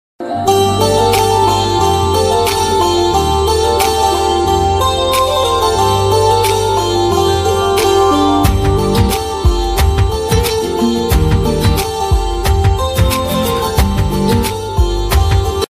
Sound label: hindi ringtone